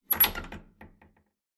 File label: stall door open; bathroom stall